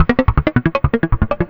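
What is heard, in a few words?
SYNTH LOOP, 160 BPM; Ueberschall; Drum & Bass Flash Trackz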